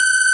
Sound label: B3 G#5, ORGAN; VOCAL ORGAN; ORGAN #4